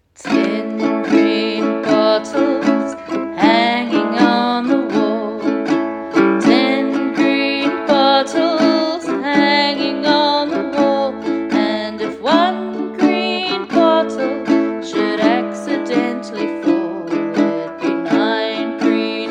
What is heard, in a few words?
Uke ten green bottles; Uke book